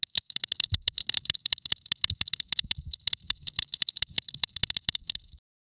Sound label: dry bone rattling skeleta; spooky